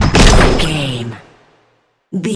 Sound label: sci-fi explosion